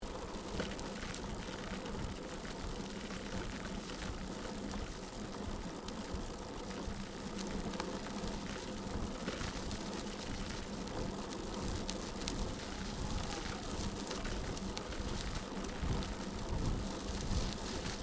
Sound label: BICYCLE RIDING COMPACTED DIRT, 21 speed road bicycle; Giant Crx3; 21 speed road bicycle on various surfaces; Bicycles